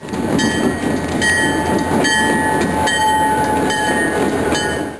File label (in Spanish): paso nivel